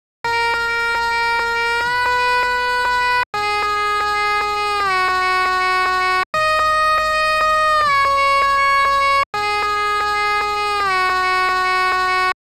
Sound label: VOX Harmony, 160 BPM